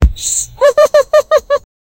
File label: a venus flytrap with no, plant